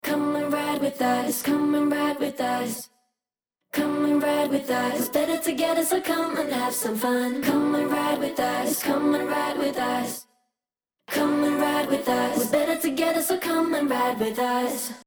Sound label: ringtone vocal chorus